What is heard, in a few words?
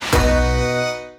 freespin wild; Wild Guns